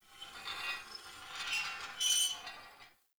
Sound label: Metal Screech Small; Props; Warframe; Tau; Ambience